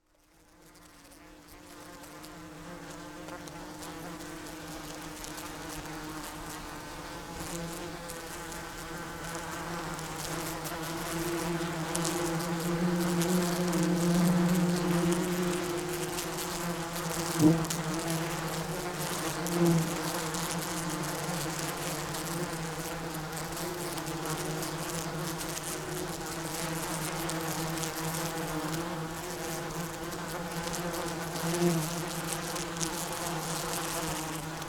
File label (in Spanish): abejas; basel; choir of species